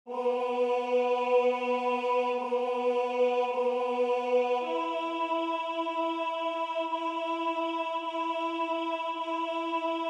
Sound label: Gloria Tenor; Vivaldi; Antonio Vivaldi